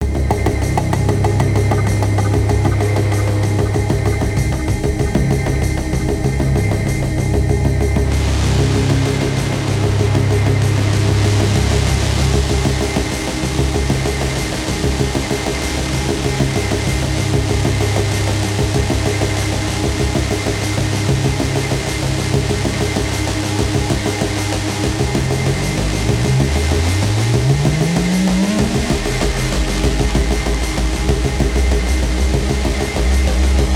Dark Busy Aggressive Industrial Building Digital Noise; CUES for film TV games etc